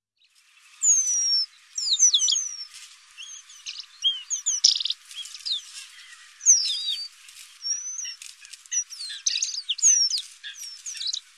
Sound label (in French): Remiz penduline, oiseaux; birds